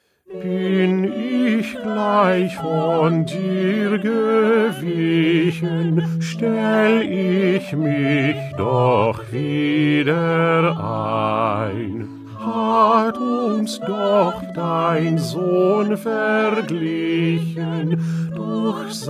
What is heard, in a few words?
Bass; Bach; St Matthew Passion